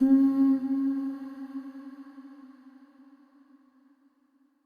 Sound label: Statue Voice C Sharp Lower; Player Instrument; Statues